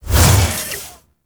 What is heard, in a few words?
Mech Melee Swing; Nechro